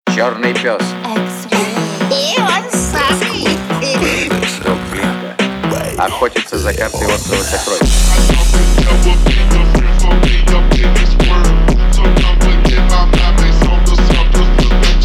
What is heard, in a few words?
37-41Hz Bass